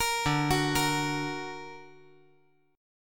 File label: D Augmented, chord